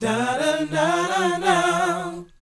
BOYZ, Vocal, R&B Dance; Spectrasonics Vocal Planet

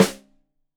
Rim Shot Stereo, drums; Deeply Sampled Drums; SMDrum

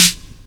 SNARE GAT, snare fx; Big Beat Samples & More; AKAI

